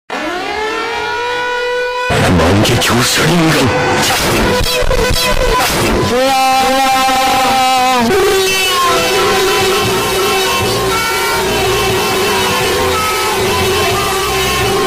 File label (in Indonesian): nada dering alarm paling keras